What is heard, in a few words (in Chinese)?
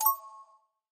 技能洗炼出高级技能音效; nba